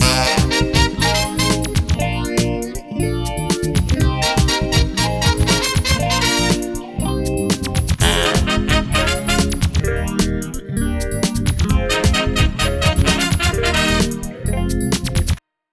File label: groove